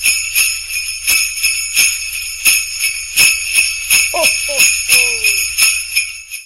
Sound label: christmas sleigh bells; Calas Galadhon Park